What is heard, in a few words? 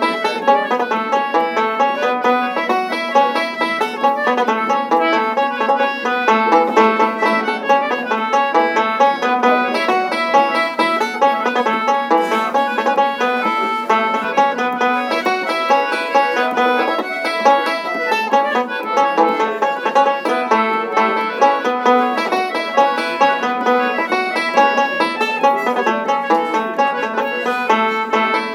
Polka